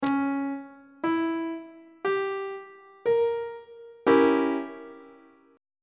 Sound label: d flat diminished 7th chord; on treble clef